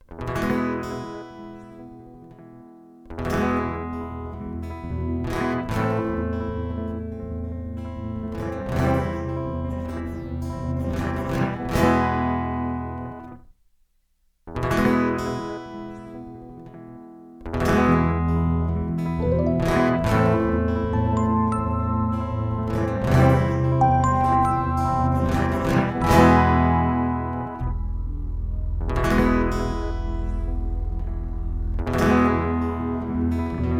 one man band

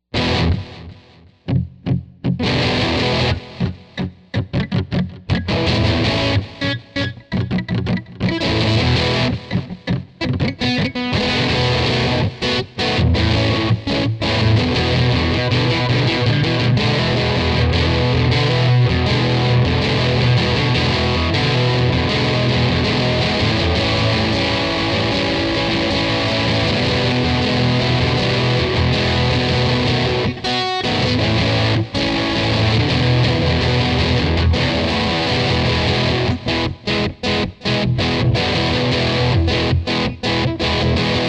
electric

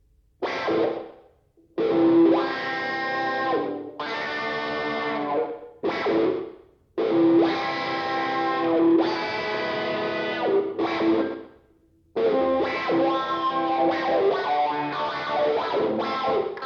electric

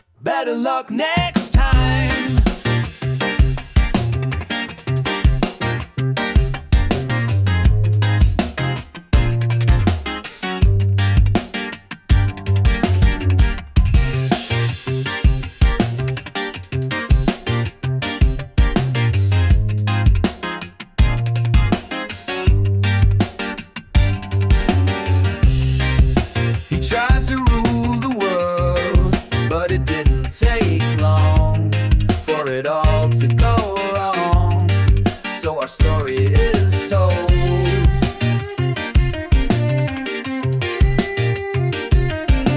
Reggae

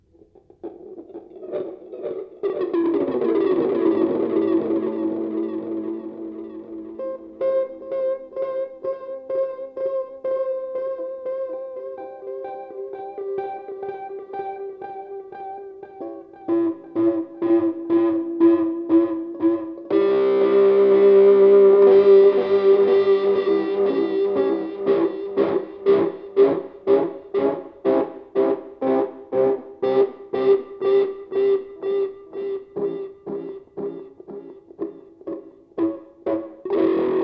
electric

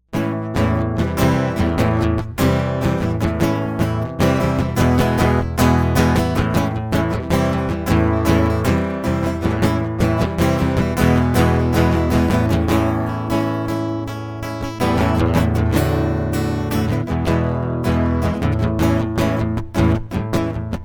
acoustic

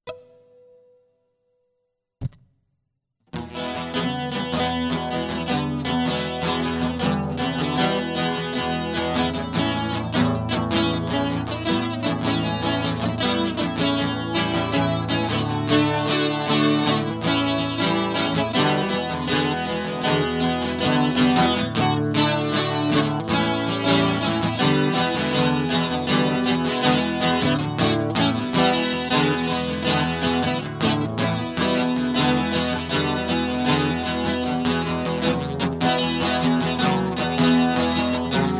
Guitar

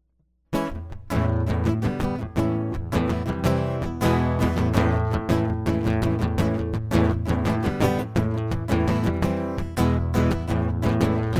acoustic

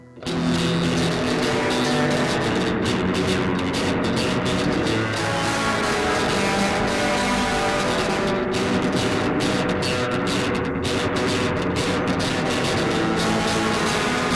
electric improv

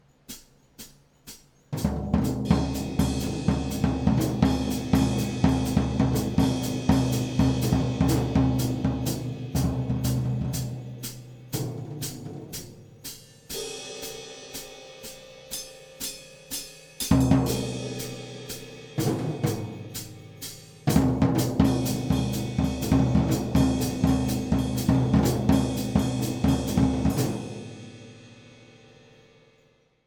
drums; How About Something Different

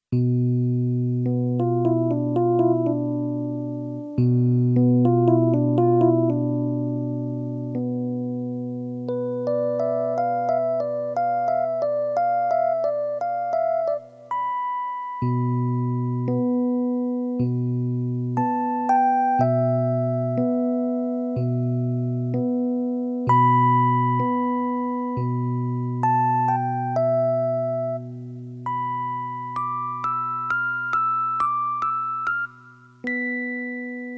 acoustic

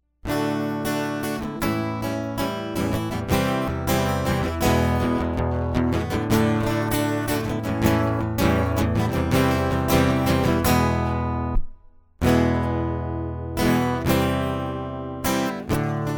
Guitar; Miint Green

